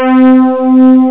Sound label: BEEP VIB; Amiga 8-bit Sampled Voice